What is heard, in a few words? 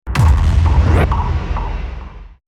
table hit complex short